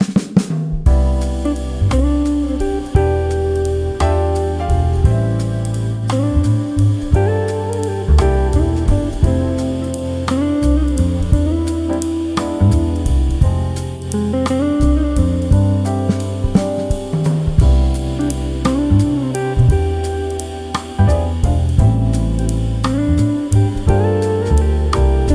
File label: Jazz Chill; ninomushi sound library